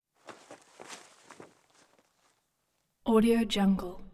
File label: cloth handling